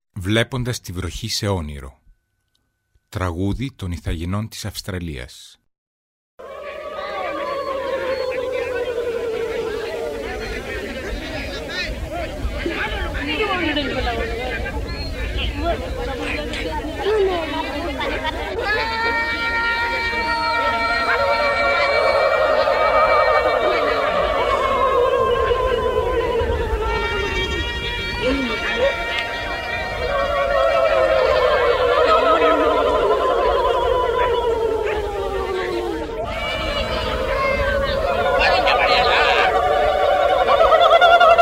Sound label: Rain Dreami, Aystralia (Ithageneis)